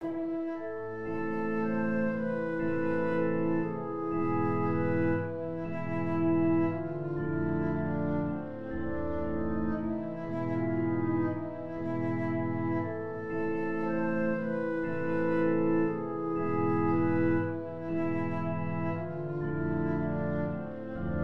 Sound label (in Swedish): orgel